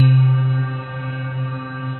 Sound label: Dulcimer verb, synth keys; Instruments; Ableton; Factory Packs; Synth Essentials; Collision